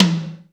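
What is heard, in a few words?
GATE tom; Crypton; DrumBase5000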